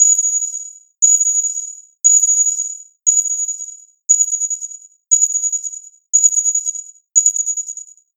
Pings

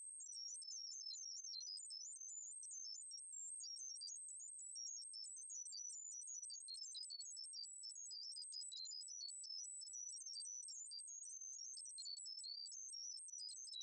Datastream

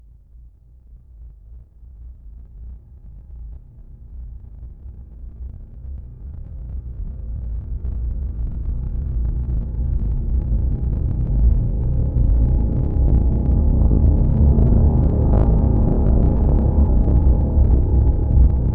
Scary Ambient Bass

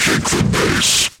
Kick The Bass; Hardsamples; Shock Force

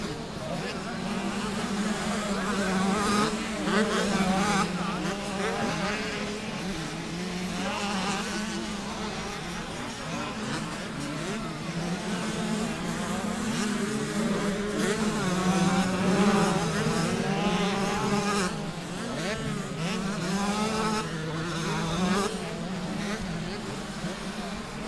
Motocross Ambience

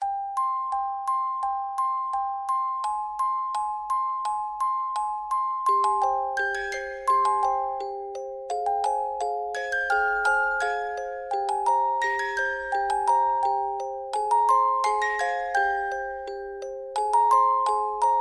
Music Box

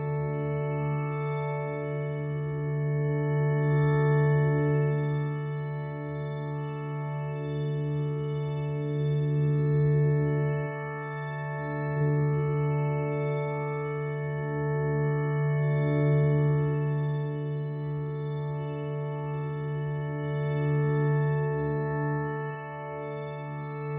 Mystical Object Aura

